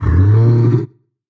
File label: monster roar long; soundscrate